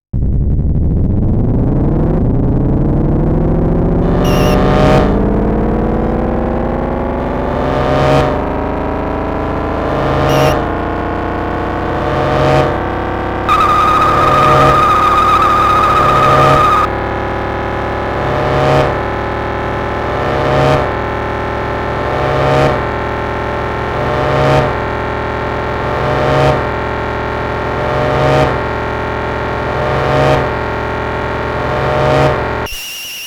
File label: Gameplay Sounds; Monaco GP